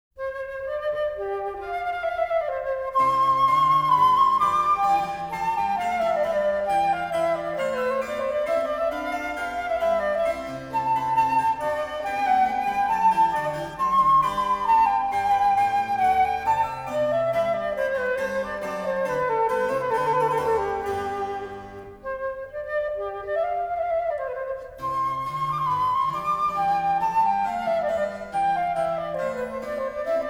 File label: Sonate C Un poco vivace; Vivaldi